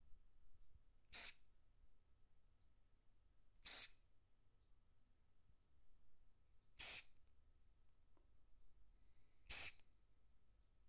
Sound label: Spray Can Short